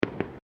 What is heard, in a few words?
Fireworks Multiple Crackles; Fireworks (Sound Effects:Backgrounds)